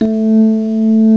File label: ELECT P, PIANO; CMI Sound Library Rev 1.3